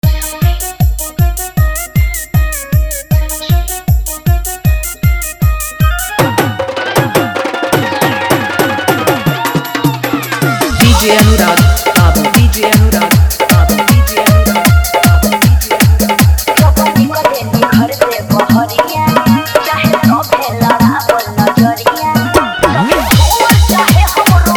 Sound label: Full Dholllki Bass Rod Dance Mix